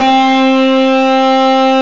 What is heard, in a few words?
tube driver; Terra Sound Library; construction set